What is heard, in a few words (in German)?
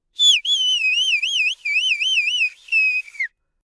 Pfiff